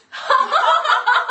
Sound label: haha